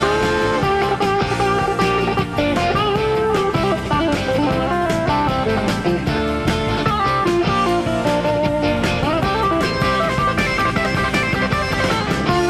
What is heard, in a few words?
Schecter strat white, guitar; 1986